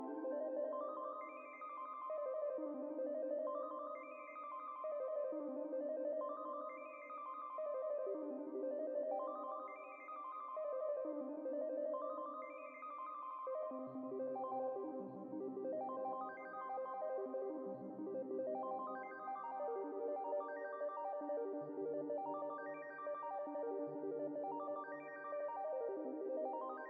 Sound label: NES Arp Level2 Start And Loop